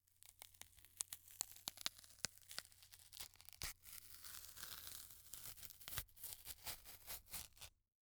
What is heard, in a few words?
Foley Banana Peeling